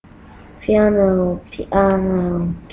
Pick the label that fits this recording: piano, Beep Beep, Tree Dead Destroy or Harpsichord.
piano